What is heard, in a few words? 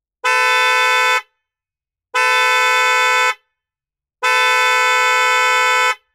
WINDTONE VERTICAL PAIR